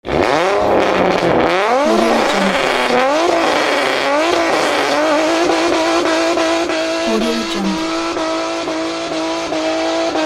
drift car on raceway

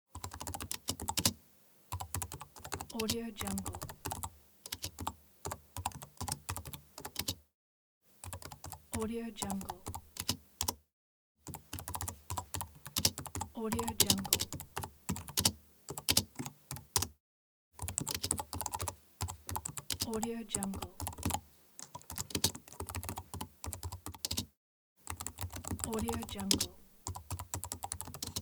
keyboard typing; welltone

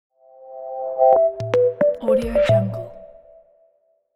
elegant reveal short

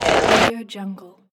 tv interference burst

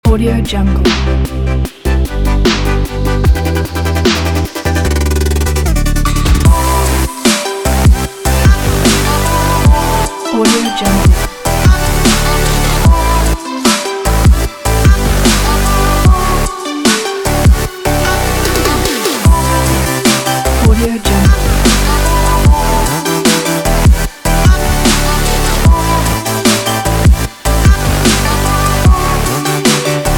future bass

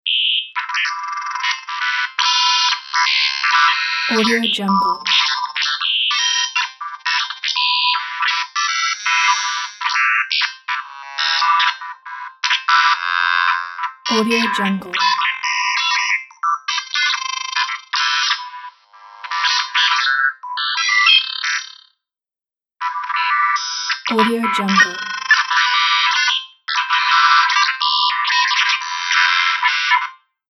malware infection

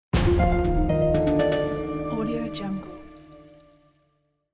antique greece music sound; tibasound

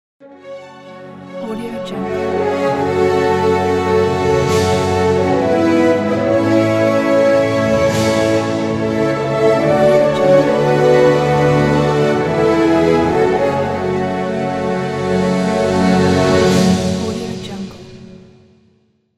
cinematic logo

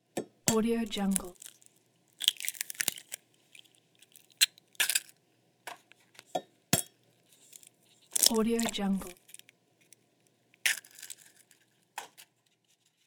cracking eggs into a bowl; fascinatedsound

